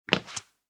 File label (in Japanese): スリッパ 一歩; 足音の効果音